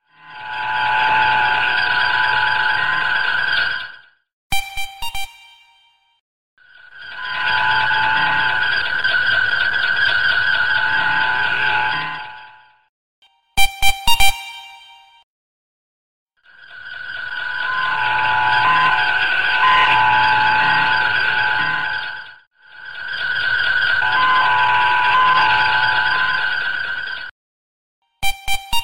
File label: Extreme Alarm Clock